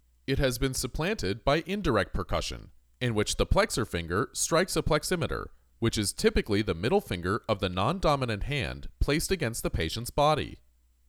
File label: Percussion